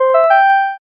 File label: alert chime